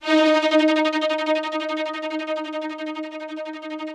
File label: ROUGH STRNGS, Yamaha VP-1; Music Store